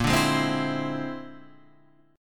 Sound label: A sharp 9th Flat 5th A sharp; strummed; JGuitar